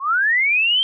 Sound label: catcall mouth